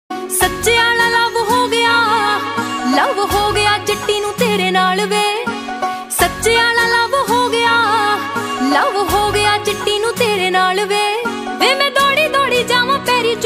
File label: DJ Punjabi Ringtone